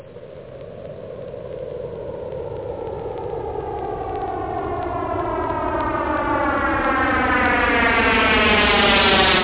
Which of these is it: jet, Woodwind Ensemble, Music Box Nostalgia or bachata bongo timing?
jet